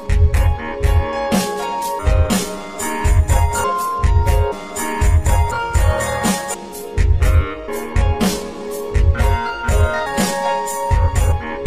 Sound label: Moto Ringtone